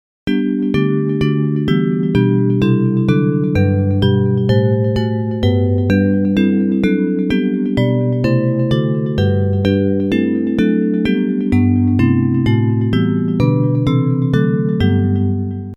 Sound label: Bells, hymn